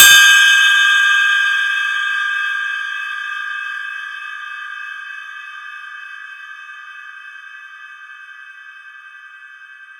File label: cymbal medium